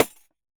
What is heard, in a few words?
Pandeiro Slap; Best Service; Festa Latina VOL-1; Single-Hits